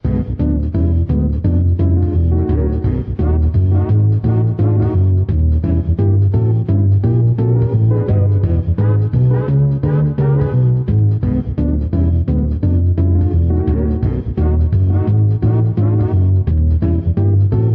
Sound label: muffled music